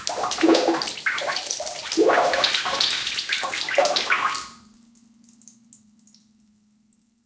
a loud wet bubbly fart; toilet

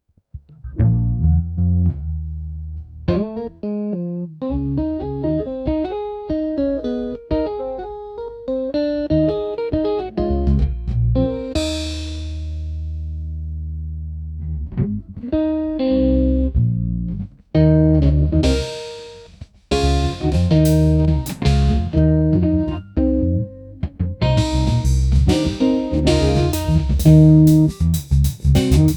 jazz music, upbeat

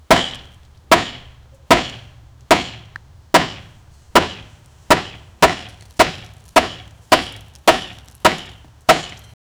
a man working hard to, axe

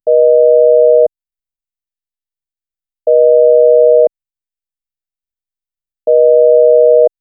voip, alternating; SFX Engine

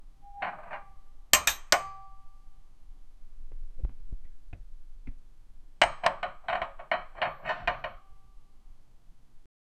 wooden panels slotting in

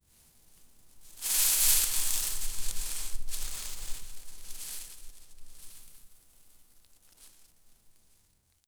magical sparkle sound

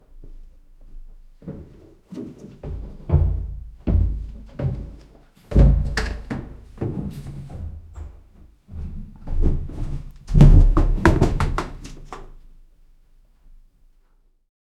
a man rolling down a

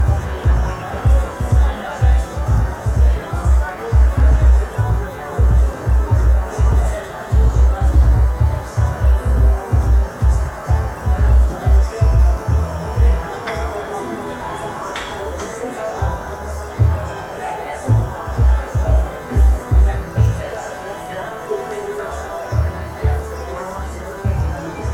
a location recording of a, dancing

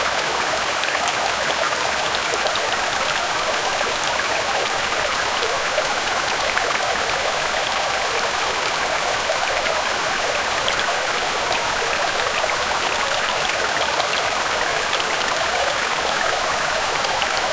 waterflow in river stream

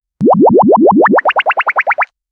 funny cartoon powerup act

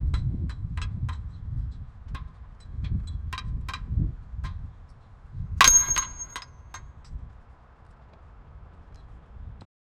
a battering ram striking; destroy

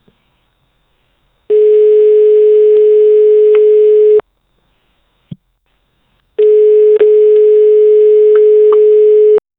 noises during phone call

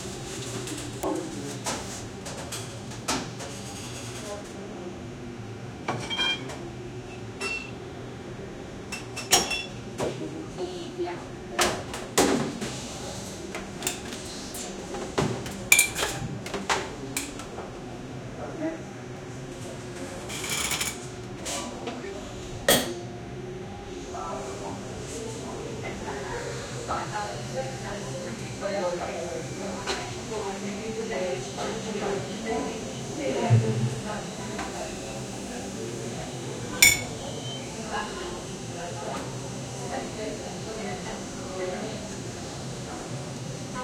cafe lofi vibe with talki, coffee machine

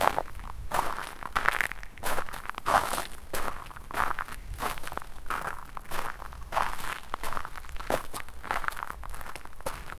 footsteps on super loose

